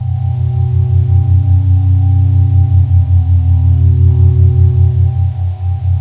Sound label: darktech